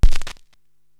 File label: DJ Old Record